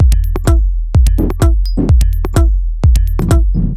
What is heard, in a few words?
C# 127bpm, House Drumloops; Zero-G House Fabrik Drumloops; ZG Drumloops